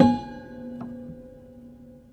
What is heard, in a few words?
Prepared Piano; Battery 3 Sample Kits